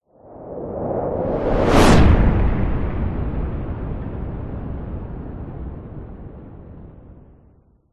sound design cinematic whoosh massive heavy fast close; zapsplat